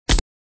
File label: gun; UltimateShooter; Enemies